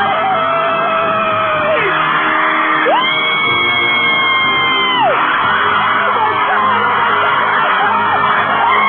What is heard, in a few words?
scream; oprah